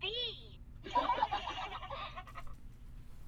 B Emoji Followed By Forced Laughter; BB Retail Therapy Sample Pack

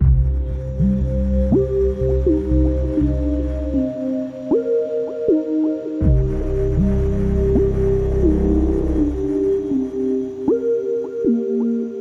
Slidey Arp; Solos

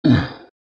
creature hurt; RPG

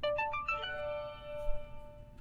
Fanfare; BB Retail Therapy Sample Pack

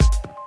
Kick Snare, Drums; BB 2HTC Samples Vol 4

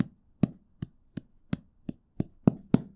Hardcover Taps, Books; bb - Books, Paper, Writing

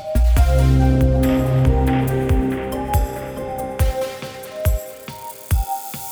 Growly, Pads

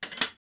tools, wood metal; wood metal SFX

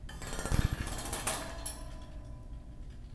Rhythmic Metal; BB Retail Therapy Sample Pack

